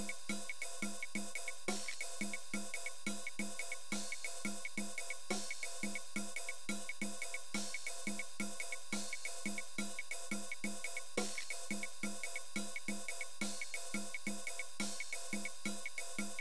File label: golden ratio perc